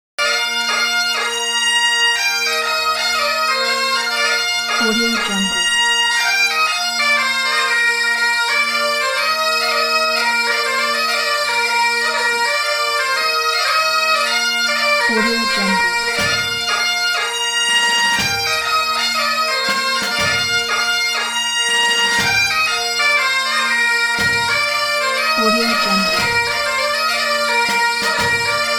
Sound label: scottish highland war pipes